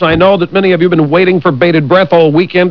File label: breath